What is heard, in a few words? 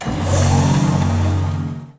hyd mach; Bombardier